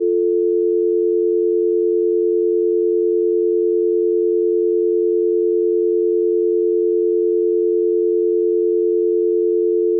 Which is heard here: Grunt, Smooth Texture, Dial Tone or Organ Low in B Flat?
Dial Tone